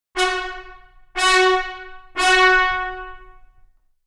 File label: Velocity, cinebrass